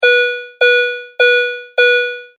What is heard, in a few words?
transportation car warning tone lights; Car Lights On Warning Tone ( Sounds When Engine Off But Lights Left On And Door Open ); Vehicles